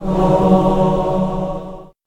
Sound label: magic holy; wesnoth